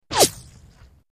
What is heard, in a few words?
Left To Right Various, bullet bys